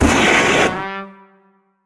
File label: trombone; rainblower; tf2